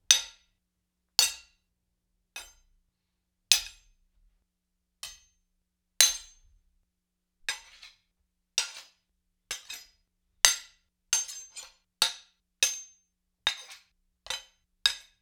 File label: Spear Spear Blade on Blade; StillNorthMedia